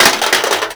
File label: Metal Damage; Warframe